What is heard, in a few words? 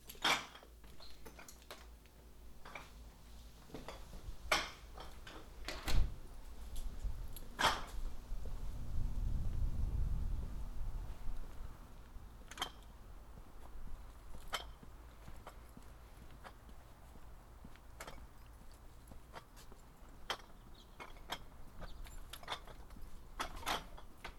Empties (Glass), Recycling, binaural